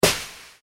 household bean bag kick; Living Room; Bean Bag Being Kicked; McKinneySound

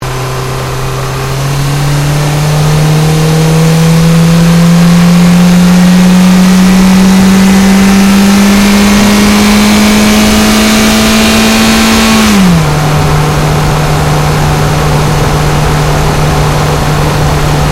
Car Ford Focus Accel Gear Change Engine Bay

